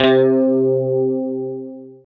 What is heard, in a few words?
GUI, E-Guitar; Sound & Vision; Gigapack I CD 2; GUI E-GUITARS; GUI E-Guitar 1